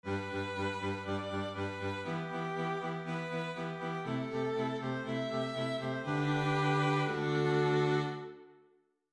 string 4tet

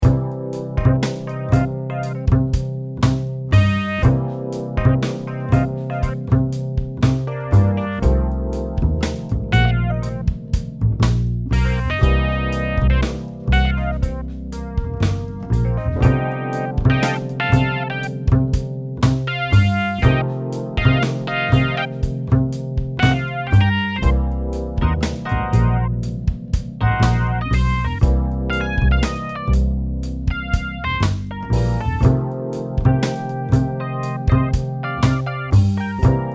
blues

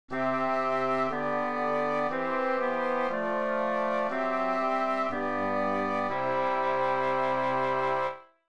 passing

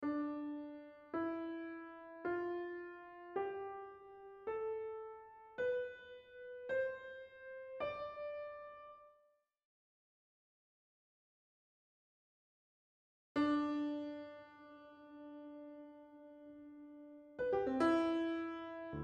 melodic decoration

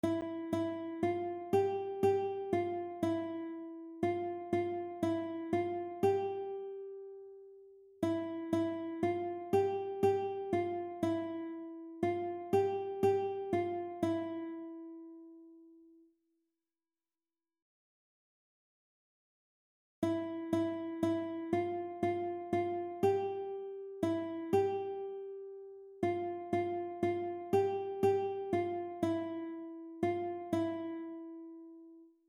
1st string etudes

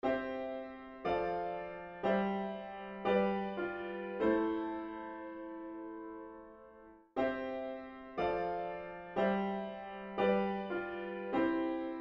keyboard harmony

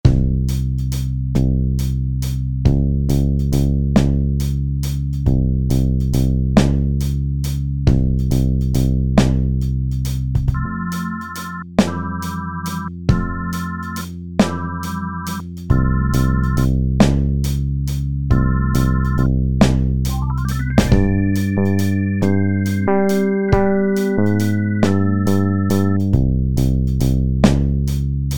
blues

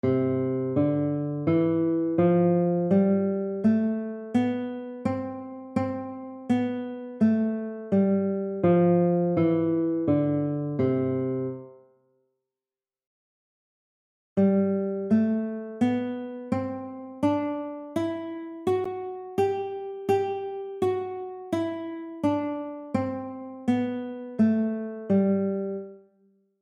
C and G major scales

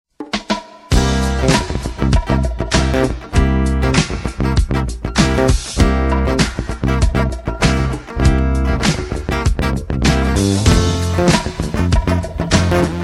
blues

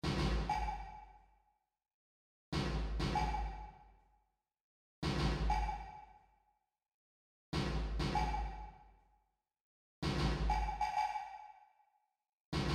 rhythm

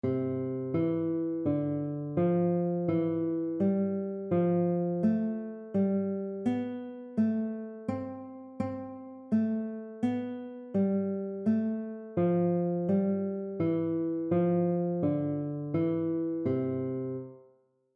C scale 3rds exercise

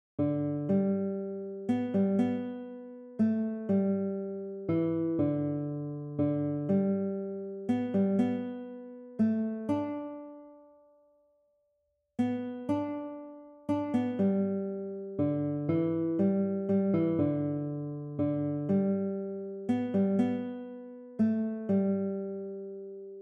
amazing grace G

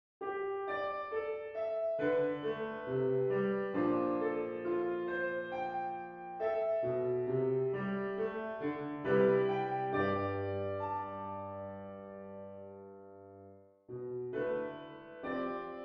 5ths

